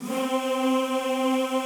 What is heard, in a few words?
C3-L, Classical Choir; Best Service; Extended Classical Choir